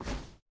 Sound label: generic motion comic skip